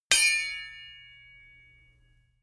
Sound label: sword hit sweetener